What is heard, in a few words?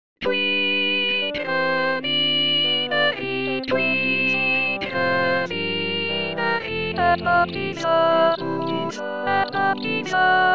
Hodie completi sunt, Sop choeur